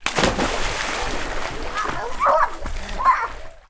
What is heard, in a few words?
splash swimming pool small child jump